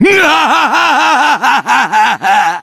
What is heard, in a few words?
laugh; Kinzo